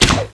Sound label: Arrow Fire